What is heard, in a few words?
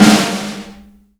SD, korgdrums; Korg Electribe; ESX-1; electribrary-3